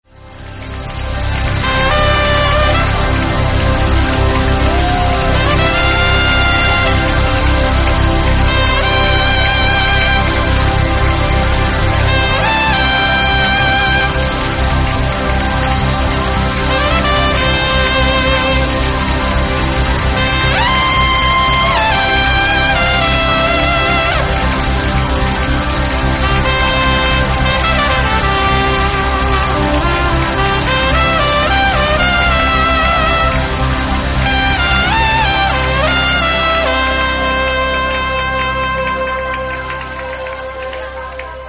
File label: Sound the Trumpet Intro